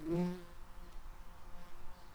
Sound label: Lapidarius workers, bumblebees